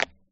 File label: minigame steps